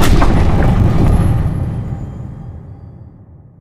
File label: slow crusher close start; Source Engine; Portal 2